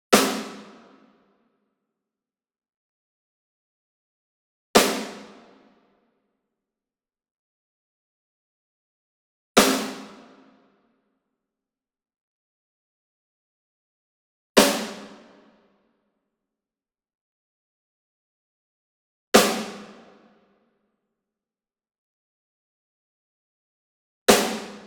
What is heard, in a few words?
SNARE Pearl High Tension Hit Rim Medium; SINGLE; boom library; Cinematic Strikes Construction Kit